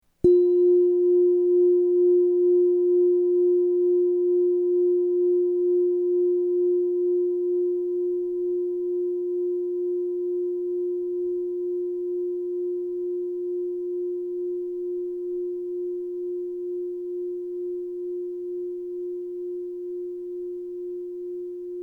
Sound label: black om